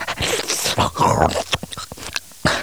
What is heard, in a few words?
chew